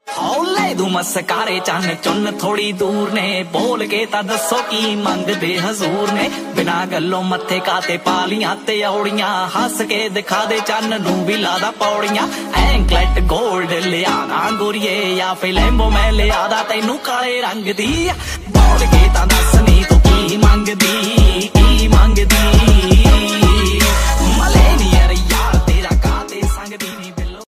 Trending Punjabi Ringtone

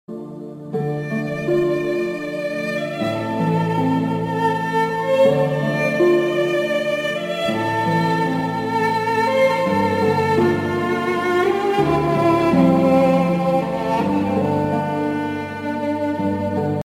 Nocturne Ringtone

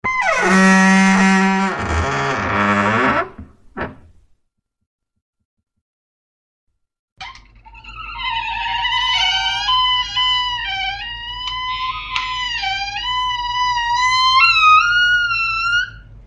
Scary Creaky Door

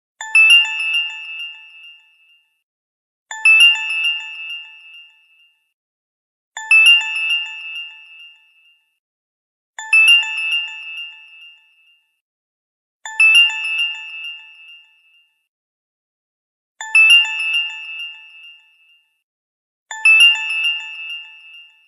Slow Waking Up Alarm

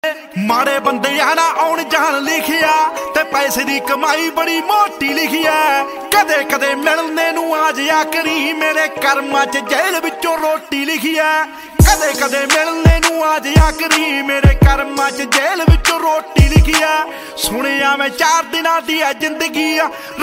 Punjabi Beats Ringtone

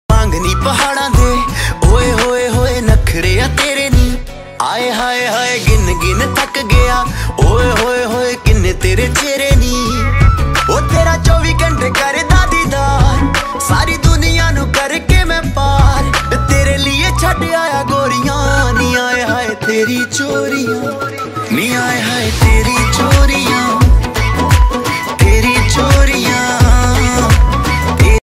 Punjabi Ringtone

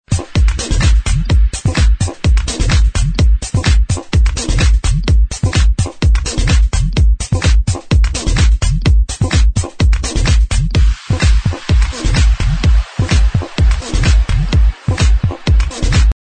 Club Techno Ringtone

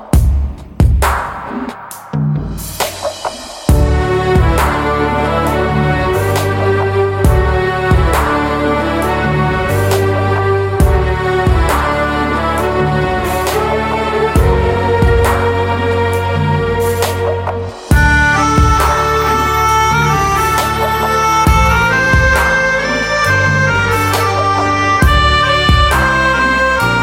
BG Tone Telugu Ringtone

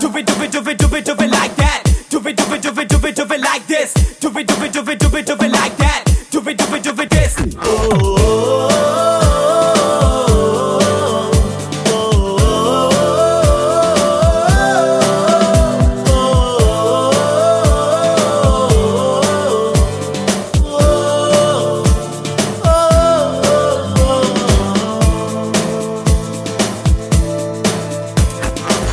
Humming, Telugu Ringtone; Orange

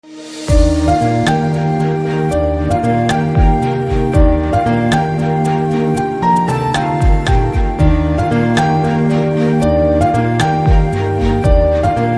BGM Telugu Ringtone

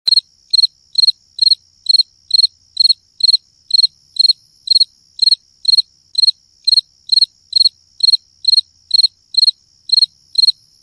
Cricket Ringtone

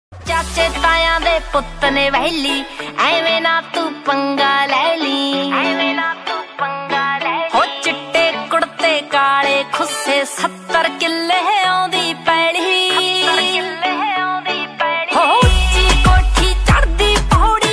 DJ Punjabi Ringtone

